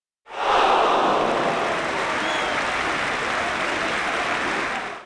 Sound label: little ooh